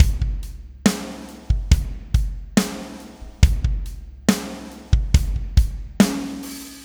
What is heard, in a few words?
SloMo Beats, Loops 140 BPM; Vengeance - Essential Clubsounds Vol.4